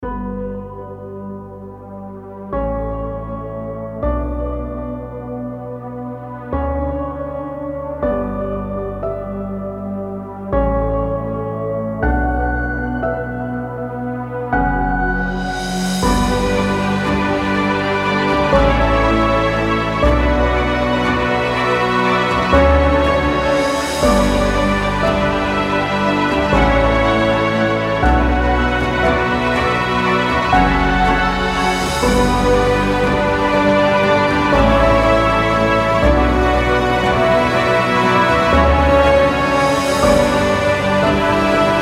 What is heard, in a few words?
Inspiring Epic